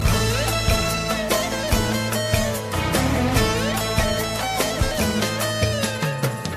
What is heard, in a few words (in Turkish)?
melodi